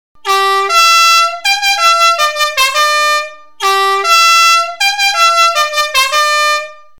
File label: klaxon brasil